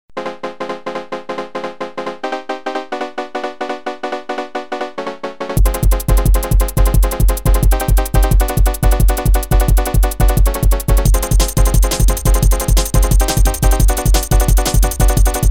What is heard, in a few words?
jungle footwork; op-1; weeklybeats-2016